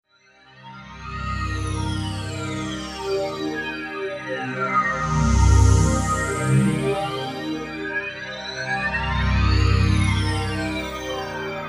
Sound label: Backdrop Wash, Production Music Beds